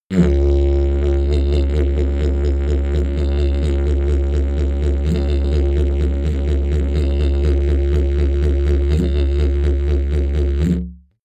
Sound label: sequence didgeridoo wobble sequence